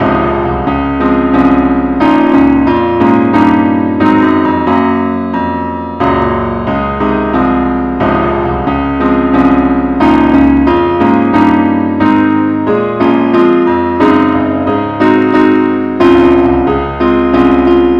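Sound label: keyboard only key D flat; Amazing Grace; John Newton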